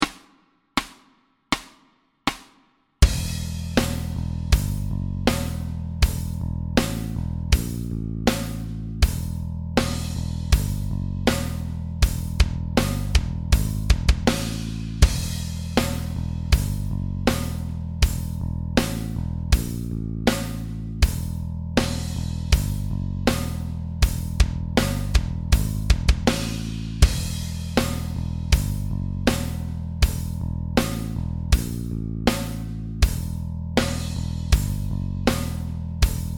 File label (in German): Metal Riff im Stil Backing Track Loop